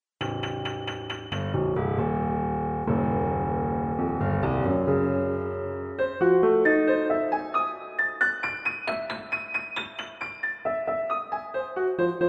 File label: piano etude